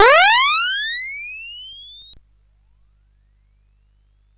Frantic, CSG8580R5

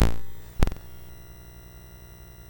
BASIC sid MOS6581R4, GAMES; Sword of Fargoal

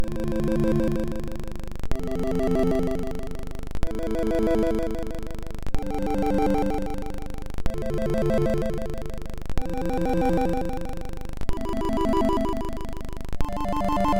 Original Mix MOS6581R2

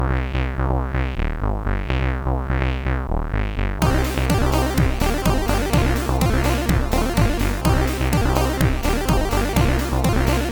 Techno Style

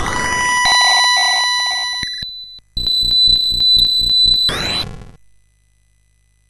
Musicbox, sid MOS6581R4

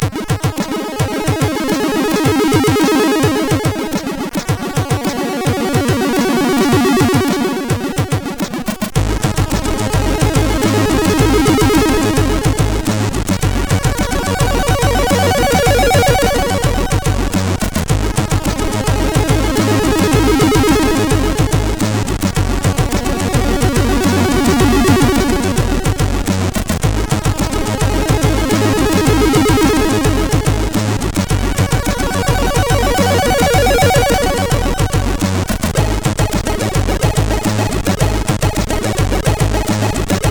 Little Beat, CSG8580R5; hvsc

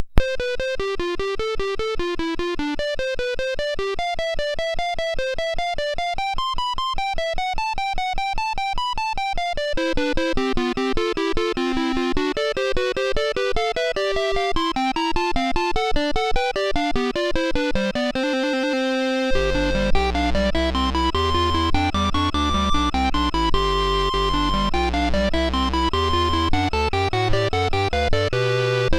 Orgel Spieler, sid CSG8580R5

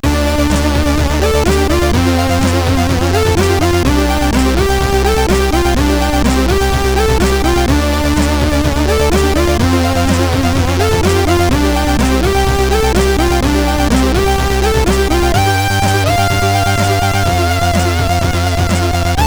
Beat, sid CSG8580R5

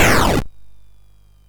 Frantic, MOS6581R4

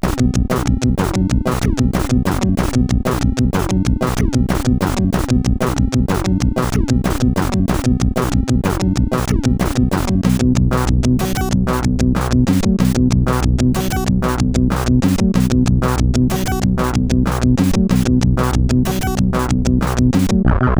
sid CSG8580R5

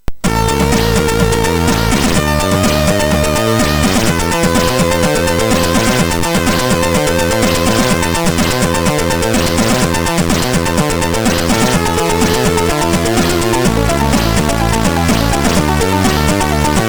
Trance, MOS6581

